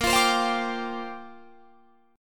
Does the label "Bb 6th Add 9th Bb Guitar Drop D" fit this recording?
Yes